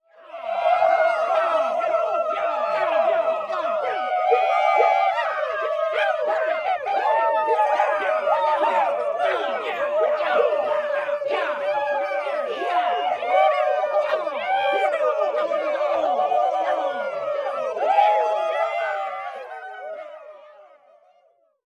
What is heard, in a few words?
Human Crowd Cowboys And Indians